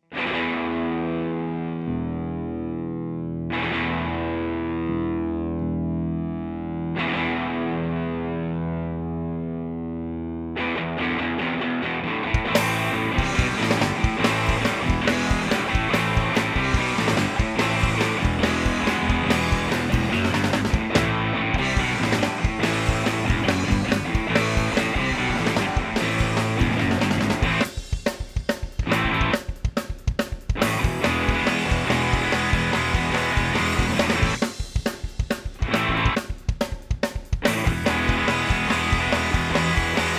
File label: Train Song